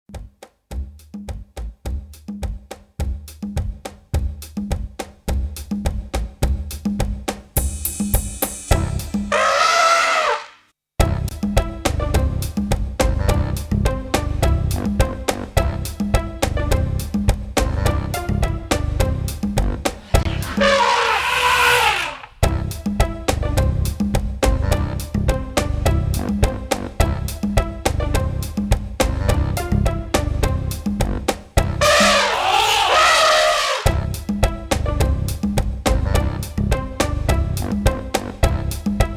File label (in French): VERSION KARAOKE; Alex et Zoé 1